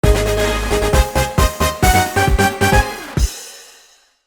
Minigame Victory; Mario Party Superstars; Mario Party 2

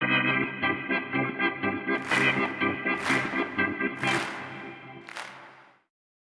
organ; R.B.I. Baseball 18

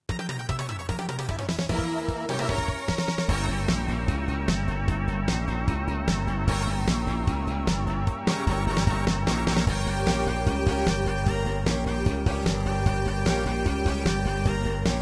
Dark Forest; Valis The Fantasm Soldier Collection; TurboGrafx-16

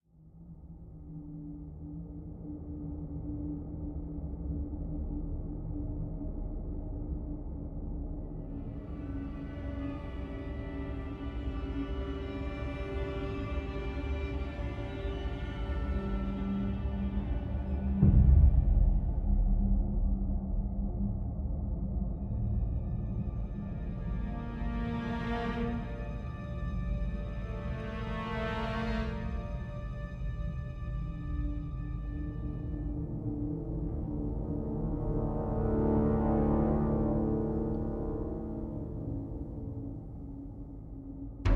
Ambient Idea Nonloop

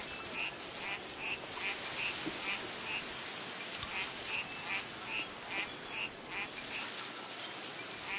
Bamboo Ambience; Bamboo Chapter Ambience

